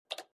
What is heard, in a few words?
click